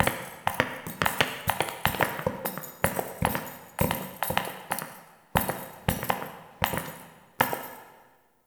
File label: Idle Walk In Circle; Tagfer; Warframe; Ambience; Entrati